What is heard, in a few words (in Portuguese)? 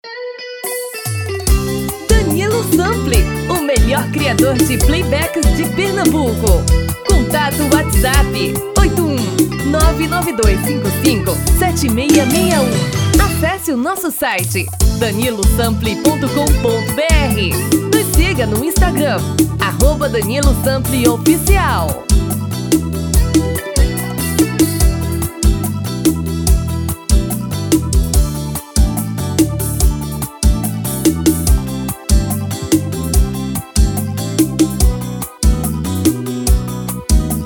BREGA